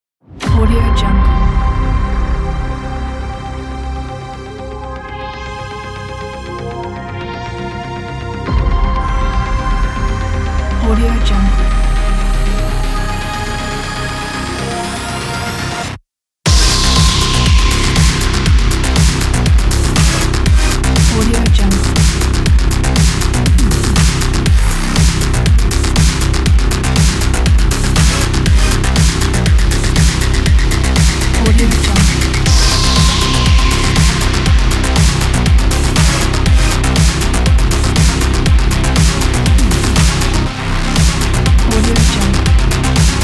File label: cinematic action adrenaline cyberpunk trailer